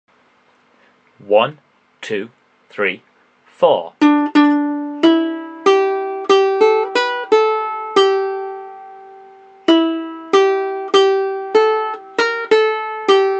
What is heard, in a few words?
solo, ukulele